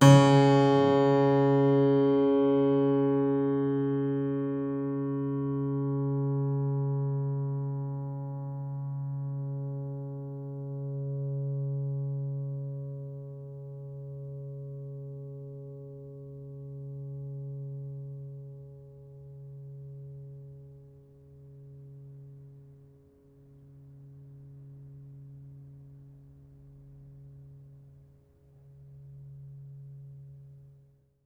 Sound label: String Pluck Ped, piano; Piano Miscellaneous